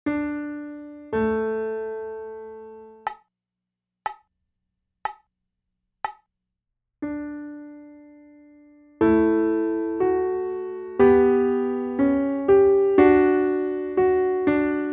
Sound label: Non Nobis Domine Guide Piano; Byrd; ed. Battle